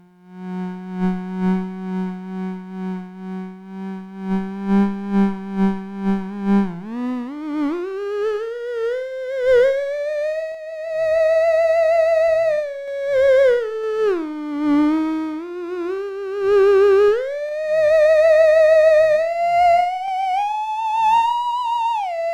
outside attack, theremin

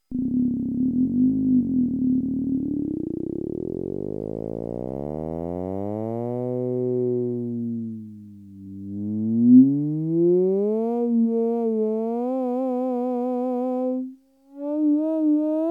wah-wah, theremin

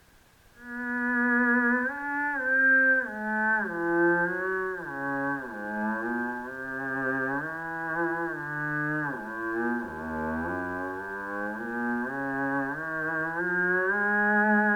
cello, theremin

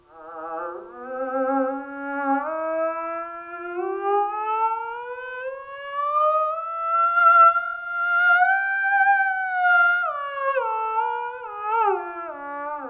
ews resonator, theremin

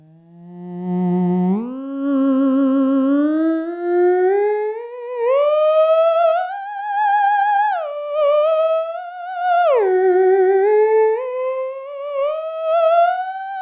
tube ss voice, theremin

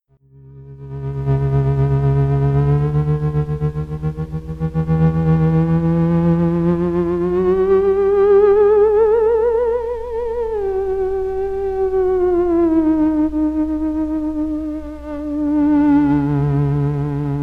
staccato; theremin

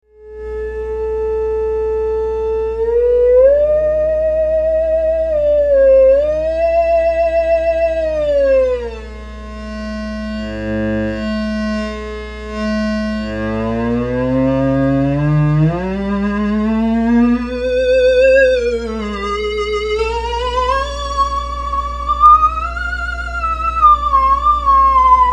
3x6 octaves, theremin

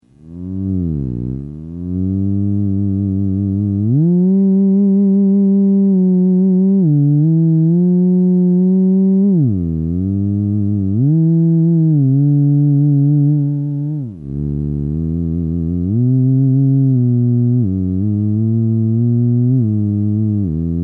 low end, theremin

